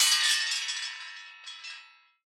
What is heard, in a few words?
Metal; SoundsCrate